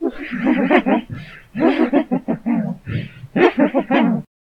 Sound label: run; I Am Weapon Revival; Jocker